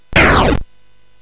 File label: Frantic, CSG8580R5